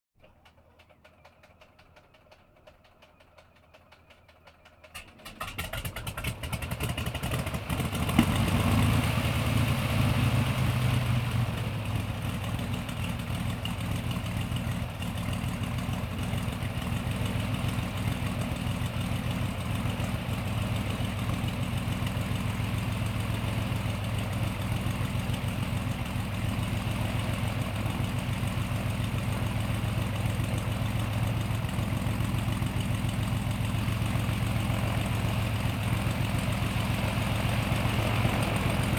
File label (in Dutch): Propeller Vliegtuig Start, verkeer algemeen; KMP Film Video Sound Effects